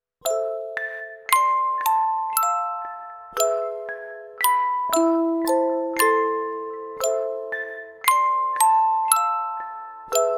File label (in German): Spieluhr Intro